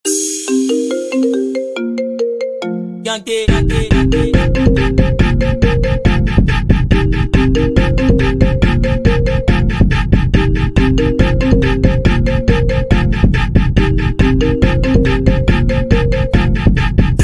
iphone remix ringtone

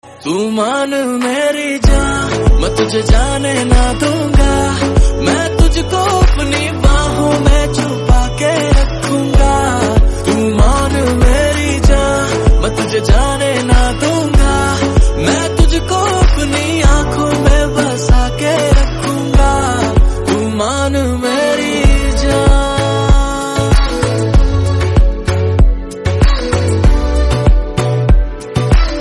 love song tone ringtone, bollywood

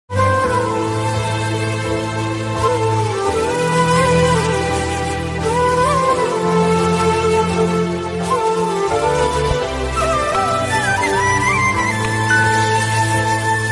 sad flute instrumental background music

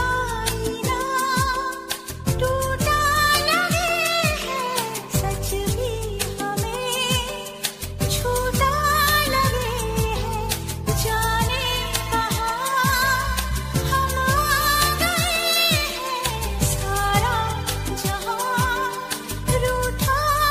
bollywood ringtone

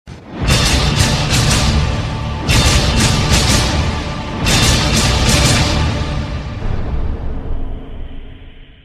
sms ringtone

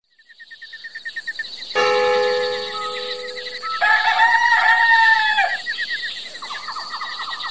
countryside ringtone

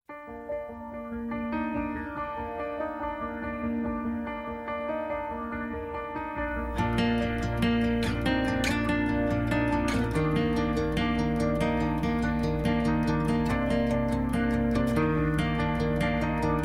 bgm english ringtone

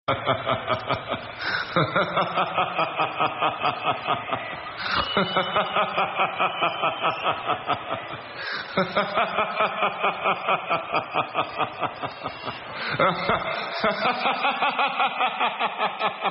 laugh ringtone

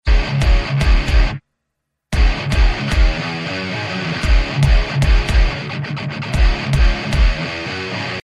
race guitar sound ringtone

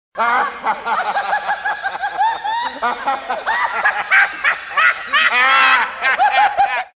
laughing fit ringtone

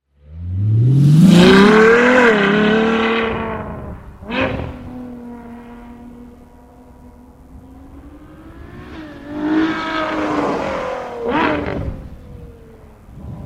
Ferrari F12 sound effect ringtone

